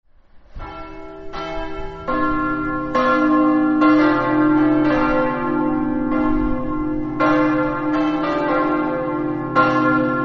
premariacco, campane

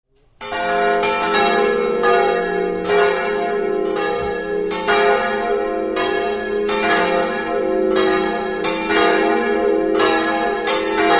lestans, campane

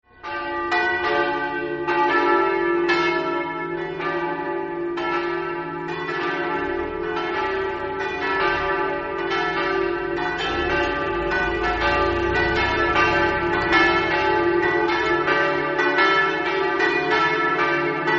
campane, Mereto

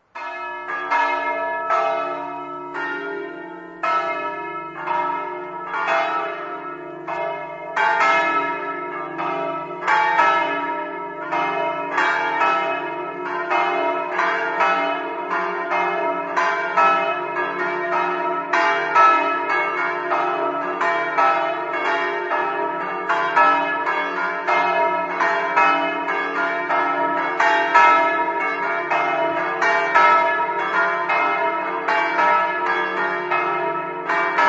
staranzano, campane

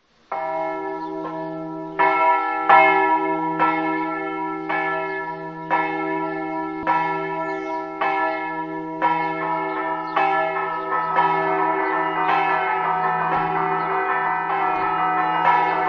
doberdo, campane

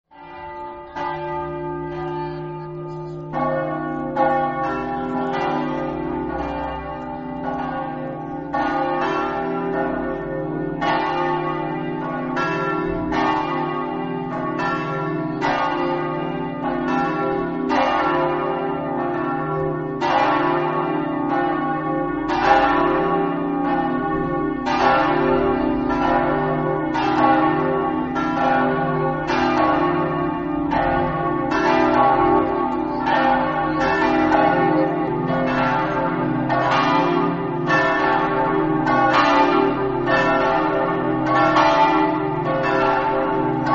forgaria, campane

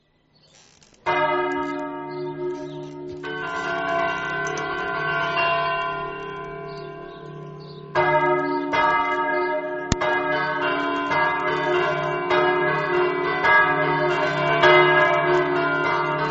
cercivento, campane

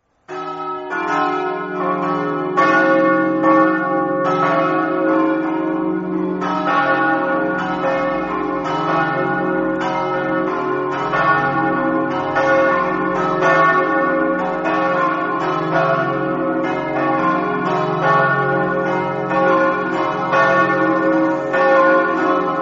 muzzana, campane

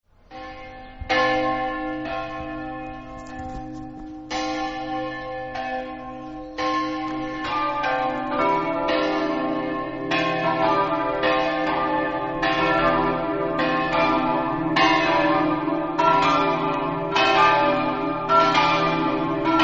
aiello, campane

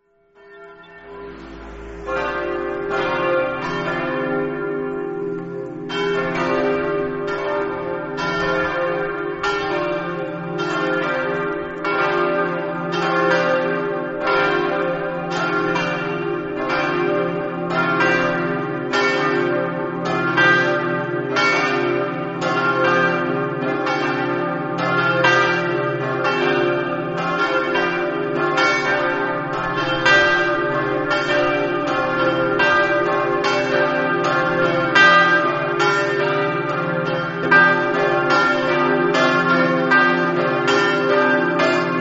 s maria, campane

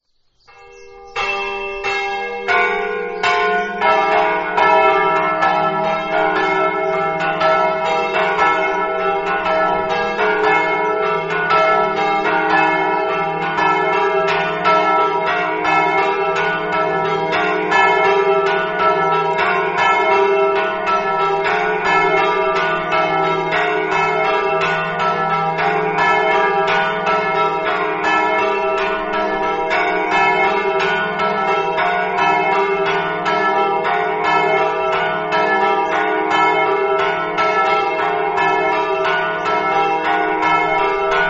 canebola, campane

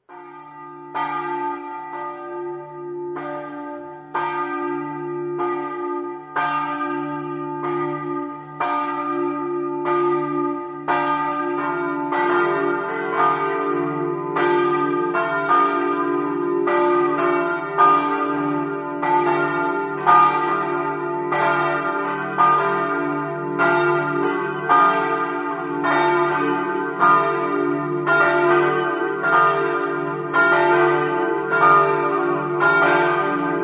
sgonico, campane

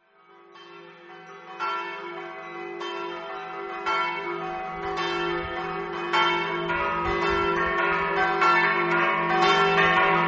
ipplis, campane

